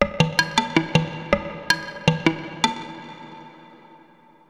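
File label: percussion, ambient, 160; eJay; Drum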